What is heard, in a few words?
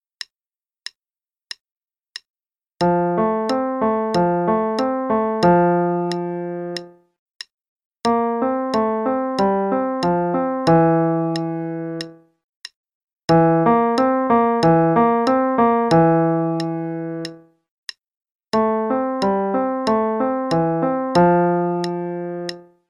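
Play-a-long, Sonatina in F, left hand only, eighth note=92; Beethoven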